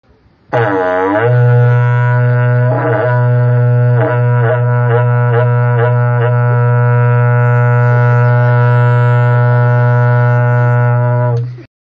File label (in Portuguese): berrante